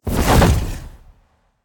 wing; smite; god cthulhu

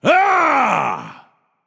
battle cry; smite; apollo

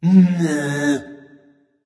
tounge; smite; voicepack; janus

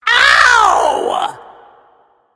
ouch; smite; voicepack; kali

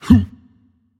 grunt jump; smite; thor

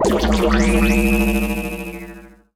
landing; smite; ahpuch skin10

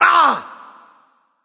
grunt hit; smite; voicepack; loki

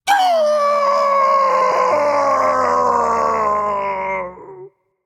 fear, voicepack; smite; jorm

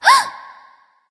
surprised; smite; voicepack; ratatoskr

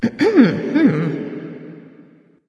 thinking light; smite; voicepack; hel

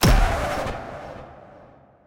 defeat scratch; hades; smite

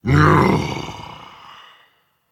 sigh dragon; fafnir

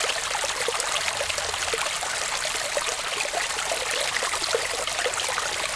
ambience water small fountain; smite; conquest

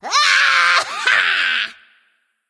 nv battlecry; smite; voicepack; ratatoskr

